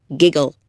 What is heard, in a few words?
giggle